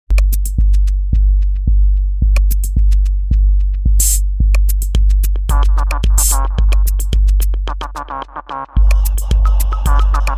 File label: Jingle, radio; Warp-x com